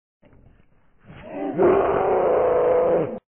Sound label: HAH